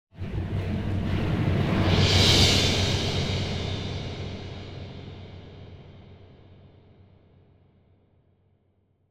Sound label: Symphonic Hit In Danger - Strings and Drums - Increasing; Hollywood Edge; sound designer's toolkit v2